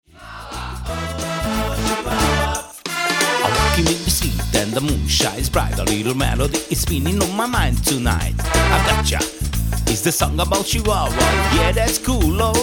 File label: chiwawa